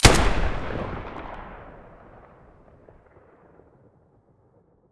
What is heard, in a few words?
muzzle galil stereo, weapons